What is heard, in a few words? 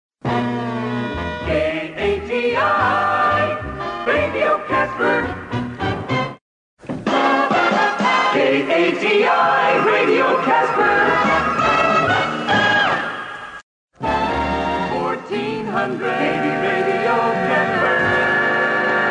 Jingle Package; KATI